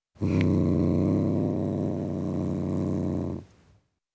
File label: Tom Cat Growling, Individual; Cats; BBC Sound Effects Library